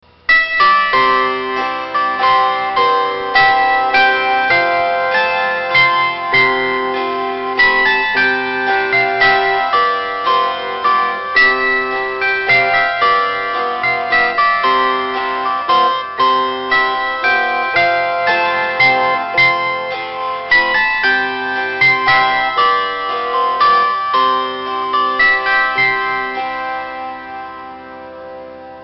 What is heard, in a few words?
fretless zither; Kellywilliams